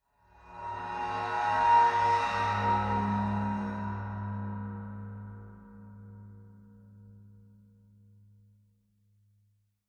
cymbal high, ambient; cymbals

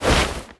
body oneshot, foley

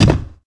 footsteps land glass; player

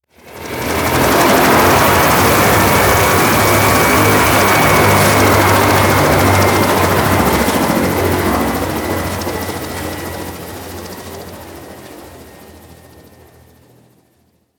heli pass quick, machines; ambient

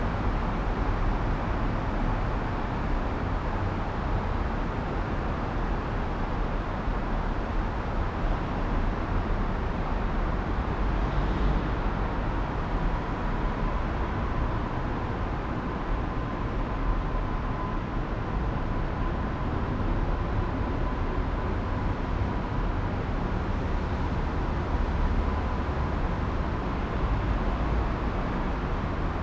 interior tunnel; ambient; Counter Strike Model Pack; CS:GO; dust2

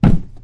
wood box footstep; physics; Portal 2

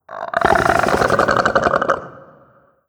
idle croak, barnacle; Half Life Alyx

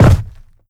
land, footsteps, player, carpet

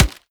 cardboard, bullet impacts; physics; Half Life Model Pack

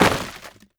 break cardboard box small; physics; breaking

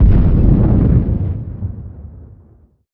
molotov detonate distant; weapons; Counter Strike Model Pack; CS2

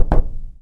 drywall footstep, plaster; physics

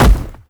human bodyfall hard; npc

